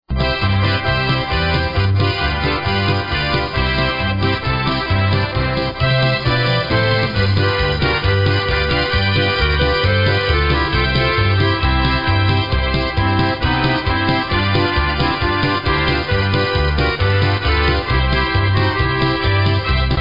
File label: Polka